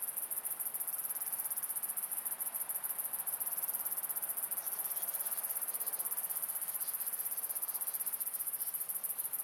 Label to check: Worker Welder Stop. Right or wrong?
Wrong